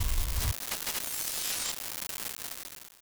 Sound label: Un Lock Lobby Computer